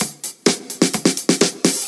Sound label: Fill Ins 128BPM; Vengeance Samples; VEH2 Loops